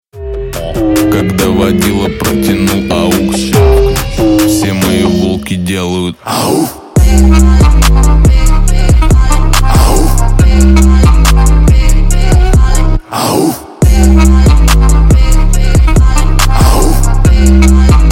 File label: the first station auf bass boosted remix